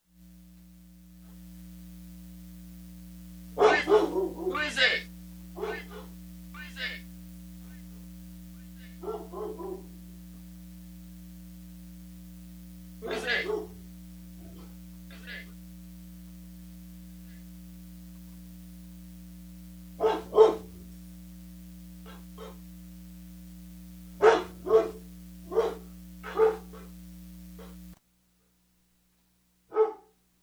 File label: Dog Vocal; Barking Up the Right Tree